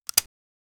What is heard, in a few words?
short earcon indicating a